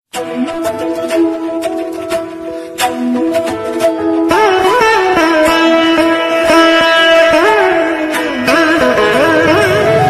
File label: jesus ringtone christian ringtone christian songs bgm love ringtone